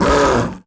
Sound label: Panther Hurt Vox; Civ4 beyond sword